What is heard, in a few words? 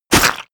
hit blood heavy